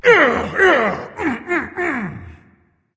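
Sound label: non verbal yay, voice; cernunnos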